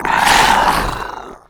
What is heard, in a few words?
Crawler Play; Gollaka Crawler